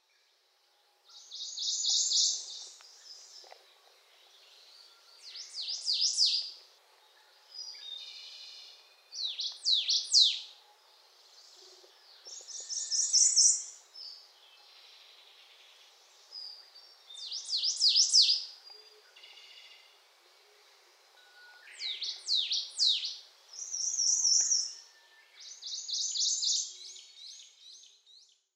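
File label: Ijima's leaf warbler, miyake; iijima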